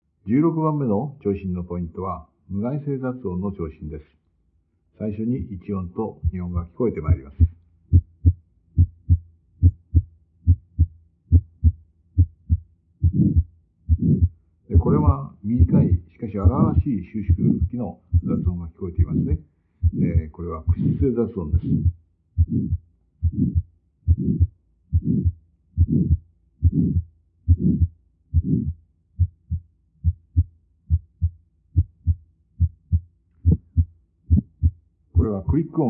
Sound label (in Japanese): 無害性雑音